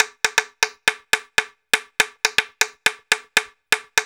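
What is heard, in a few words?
120 bpm, Tonga Gruv; Spectrasonics; Supreme Beats; African Contemporary; Tongatown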